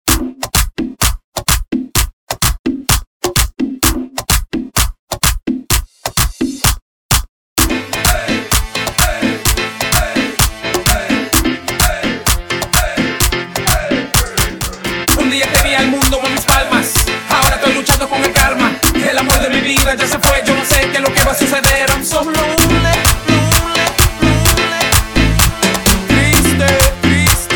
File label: remix bpm 128